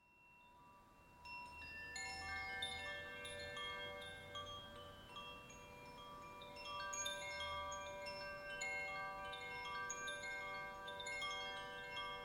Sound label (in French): Voix et carillon en sol